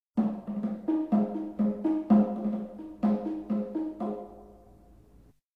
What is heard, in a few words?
Bongos